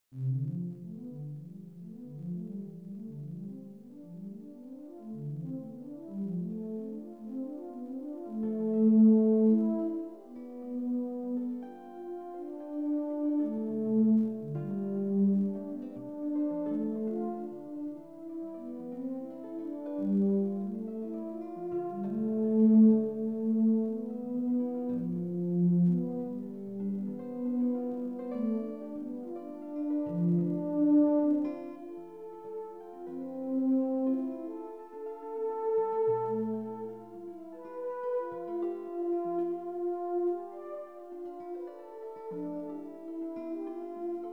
comma and septimal comma steps, fractal music chord progressions